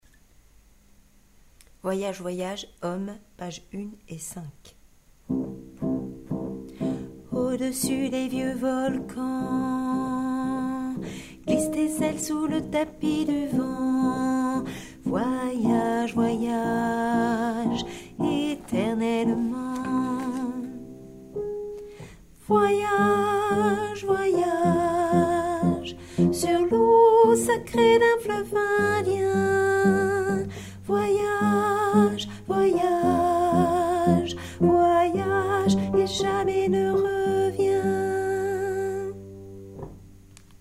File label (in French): hommes